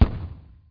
DROP

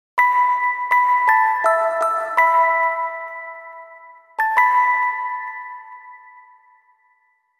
Music Box; Grimoire Song; Rune Factory Frontier

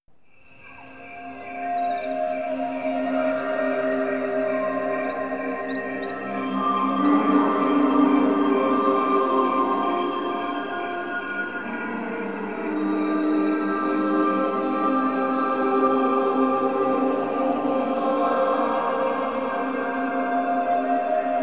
Forest and Hills Ambience (Night); Monster Hunter 2 Dos complete soundtrack; Area 10

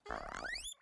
chirps; Totally Tangled; pascal

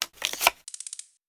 card select; This Is the President

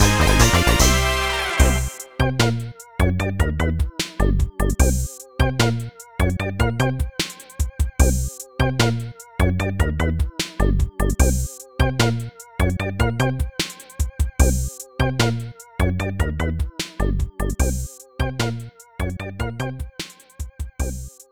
Level Clear - Fanfare; Face Raiders; Nintendo 3DS

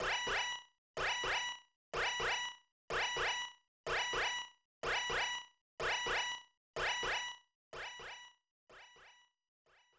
Engage Alarm; Super Scope 6

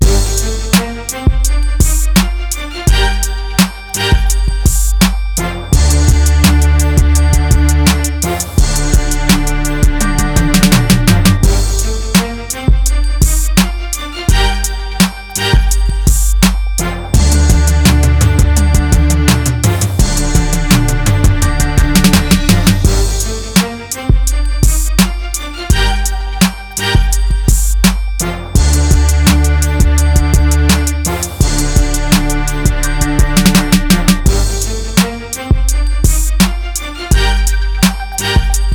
Instrumental; Fight Night Round 3; xbox gamerip

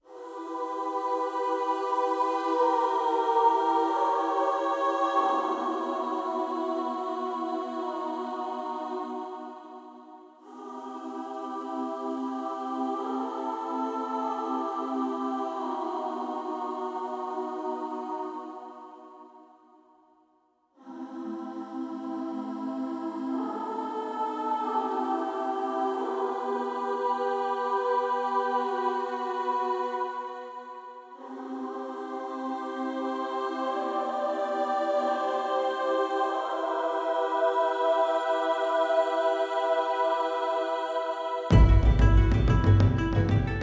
Music Intro

